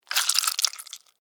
Squish Suction, Texture, Squish, Suction, Wet, Juicy; Squishes; Objects